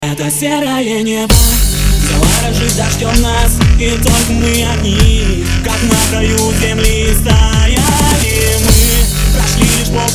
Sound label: dubstep version